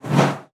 BOW PERC, BASS FINGER; Xsample VOL-5

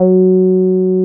TB C5, TB-303; Masterbits; Vintage Voltage

